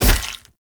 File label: Sphere Polearm Medium Impact Flesh; Weapon Impacts; Player; Combat